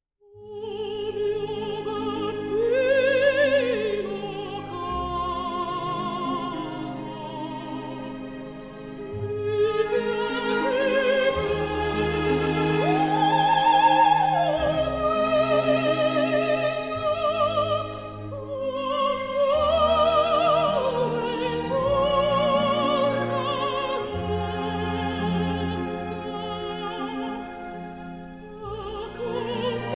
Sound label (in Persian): اپرای مادام باترفلای; جاکومو پوچینی